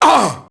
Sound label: merc get hit; enemy; Max Payne